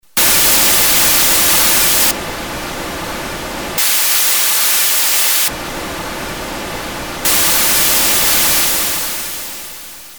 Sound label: 3 noises OSC2; Red Sound Darkstar